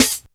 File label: SD, Dance Mega Drums; Best Service; SD-HIP